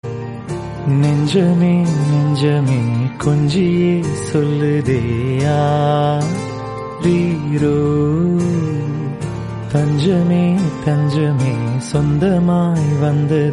Tamil Song Ringtone

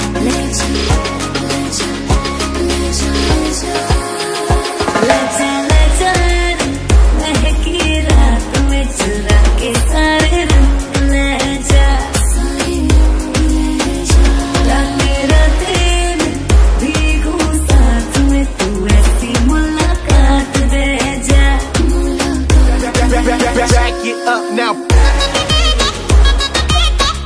Female

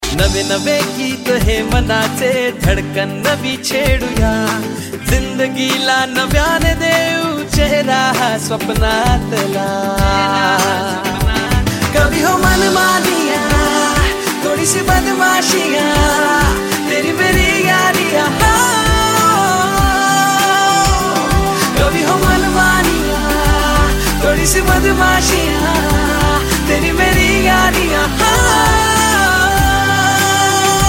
Marathi Ringtone

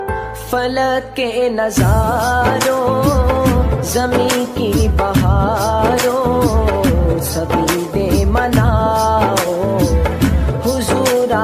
New Naat Ringtone

